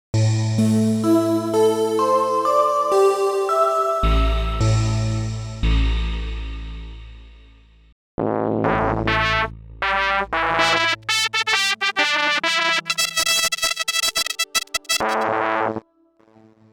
yamaha ex5r sounds, fdsp ep pickup, choir raw and ep pickup